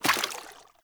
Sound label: Corrupted Sproutfolk Grunt Step Water; Enemy; Footsteps